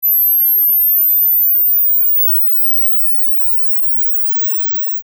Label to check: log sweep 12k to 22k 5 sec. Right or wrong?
Right